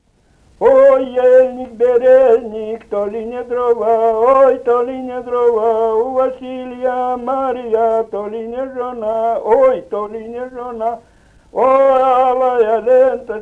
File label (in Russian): Ой, ельник-березник, то ли не дрова (свадебная)